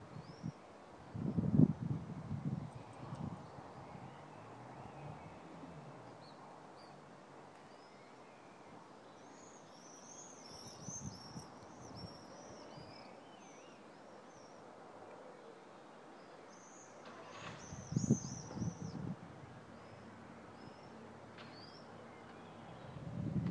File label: birds on walk home plus cat and phone text tone